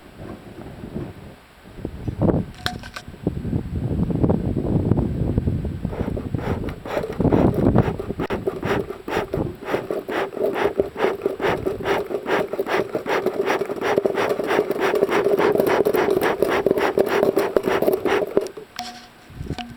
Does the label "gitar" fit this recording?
No